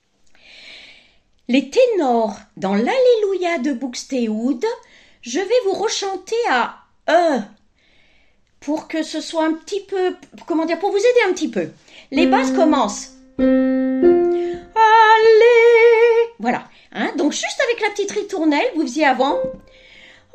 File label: TENOR; BUXTEHUDE